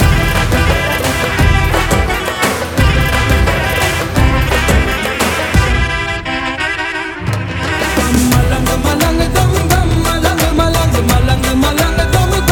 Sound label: Song Promo